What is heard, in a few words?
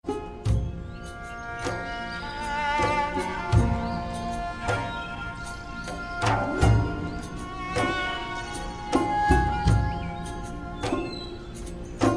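saddest tone; alternative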